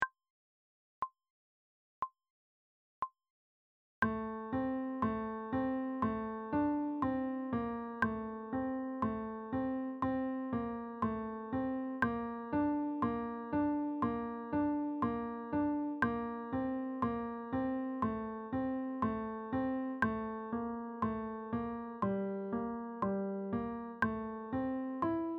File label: clarinet; Pavane, CL, 13-19; Selkirk Senior Concert Band